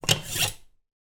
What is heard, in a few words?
household kitchen knife pull out of holder